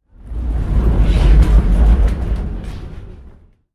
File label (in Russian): zvuki s effektom peremeshcheniia videokamery